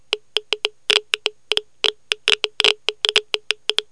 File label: Geiger Counter; City Life; Business